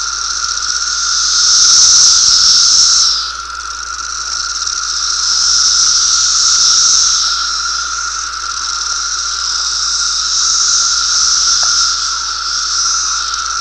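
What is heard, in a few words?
cassini chorus, Periodical Cicadas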